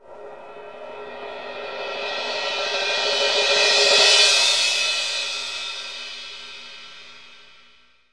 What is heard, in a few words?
Joy, happymusic; 特酷声音素材库; 背景音乐